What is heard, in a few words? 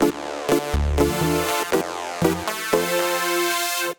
Brass (Dm) 120bpm; Music Loops; Tymes Brass; Ableton; Factory Packs; Singularities